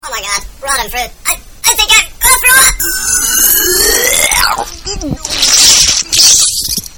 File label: Puke Distorted; Ringtones